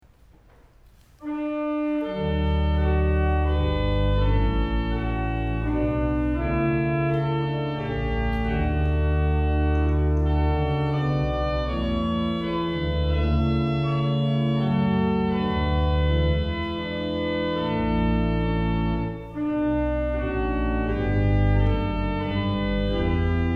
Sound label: Chorale, organ; Variaties Over Ontwaak Gij Die Slaapt; On A Melody By J. G. Bastiaans